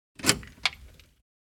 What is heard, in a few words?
Motorcycle keys and ignition locking; vehicle